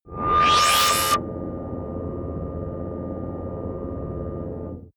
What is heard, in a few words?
turn on device